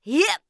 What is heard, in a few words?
soft, assassin voice